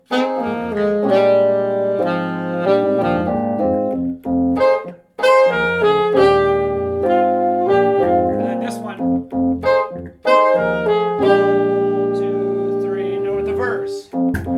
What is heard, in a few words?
Hornline; Rooster